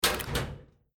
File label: lock Door